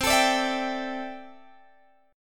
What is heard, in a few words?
C 6th Add 9th, C, down, Guitar, Open G